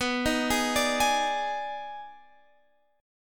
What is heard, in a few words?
Eb Major 7th Suspended 4th Sharp 5th B